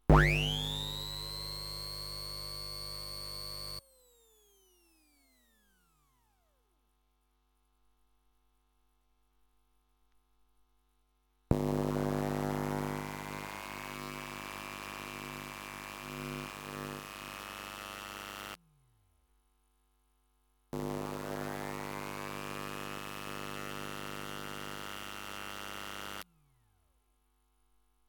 Guitar Pickup - Squier P-Bass - Dremel - One Shots; Pole Position; The Guitaresque Power Tools Library